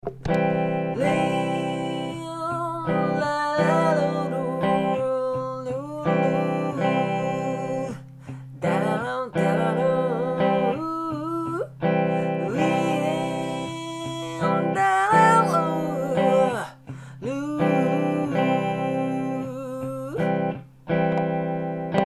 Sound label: guitar